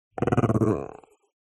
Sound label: DOG Chihuahua Growl Strained; ANIMAL; smartsound